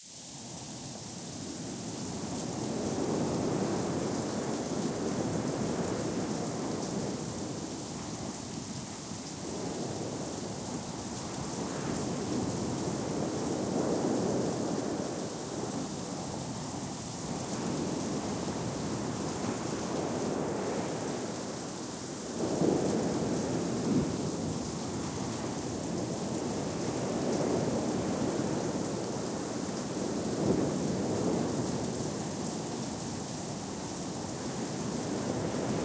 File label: bgm game rain